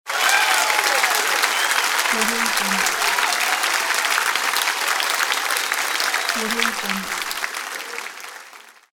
crowd cheering and applauding

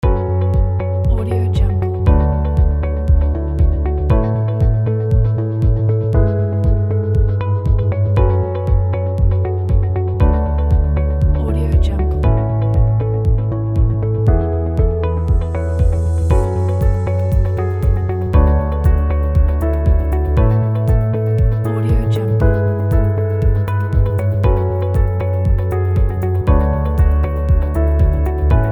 background inspirational loop

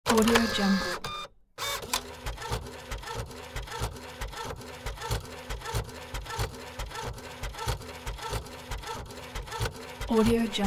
computer printing document quickly

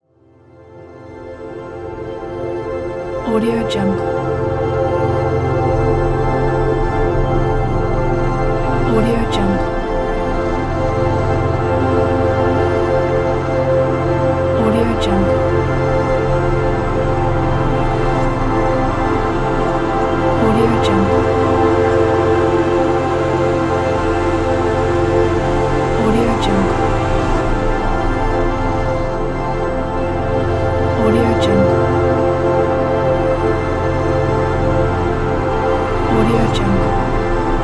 drone; aurasonic

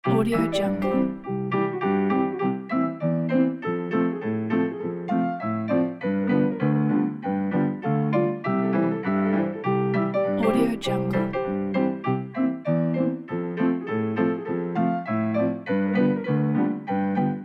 old jingle bells piano; djsapa

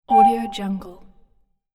spaceship signal ping

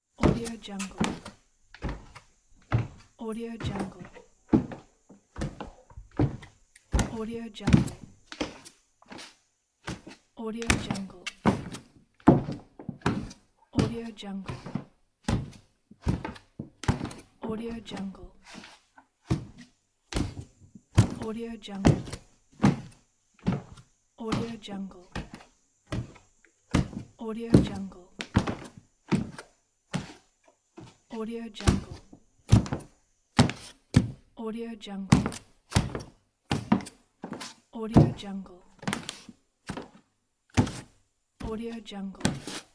barefoot footsteps on wooden floor

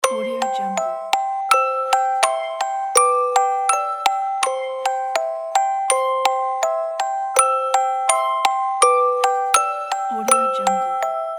lullaby music box

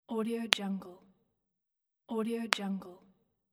knuckle crack

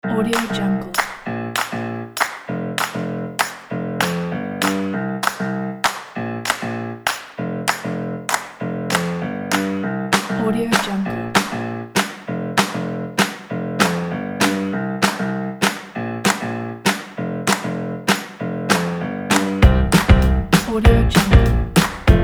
friends around a piano

